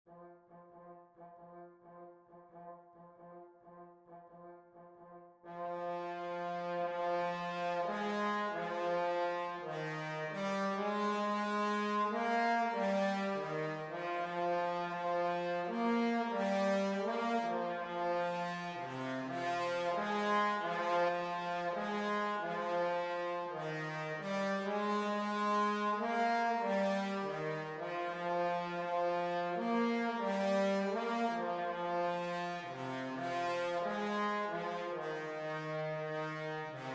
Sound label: Trombone